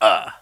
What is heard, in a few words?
Ouch